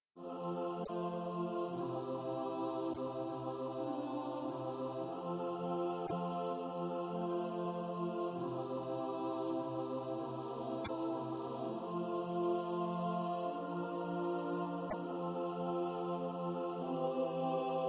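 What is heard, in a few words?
alto; Holy bible book divine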